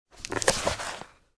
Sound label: book open; Counter Strike Model Pack; CS:GO